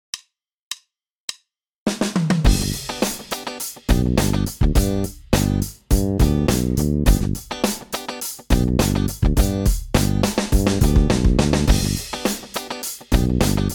ligne basse accords a 3 notes exercice; exercices

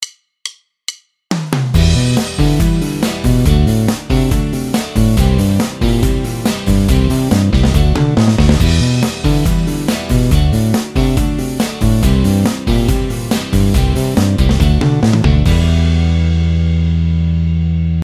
hammers

